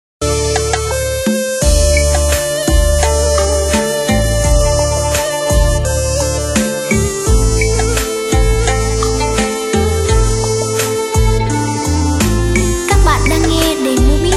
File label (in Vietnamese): beat